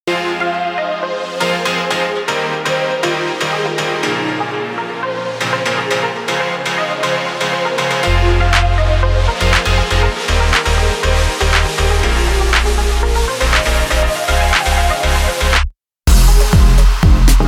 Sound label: BEATS